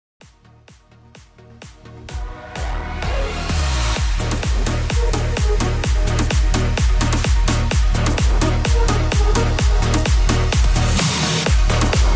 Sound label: 128 BPM